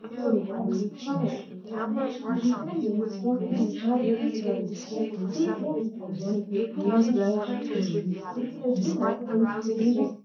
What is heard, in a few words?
female, 380ms 4cm; SiSEC11; underdetermined